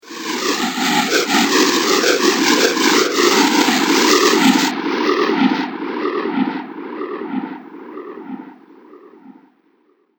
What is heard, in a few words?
Amazing Air Sound, whoosh; Andrew Fleming